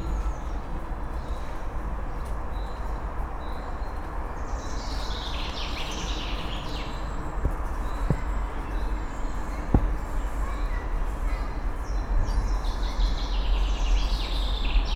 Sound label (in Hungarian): legyektől izgatott jószág, magyar parlagi szamár; szegedi zoo 2011